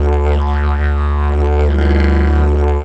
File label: DIGERIDU